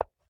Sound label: wood light hit; lila